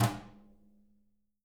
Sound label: BRUSHES, Living Drums